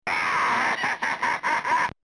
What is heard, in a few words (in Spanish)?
risa; Final Fight